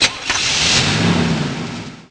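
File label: CAR START; VEHICLES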